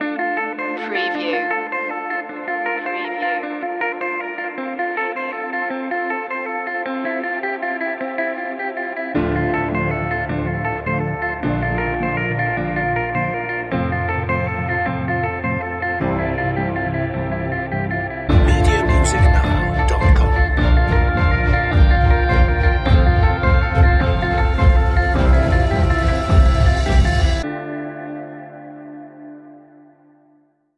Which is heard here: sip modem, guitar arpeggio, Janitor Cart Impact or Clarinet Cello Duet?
guitar arpeggio